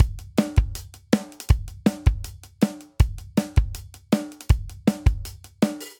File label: Energetic Pop Verse Variant 160 bpm; 4 4; MIDI Clips; Ableton; Factory Packs; Drum Booth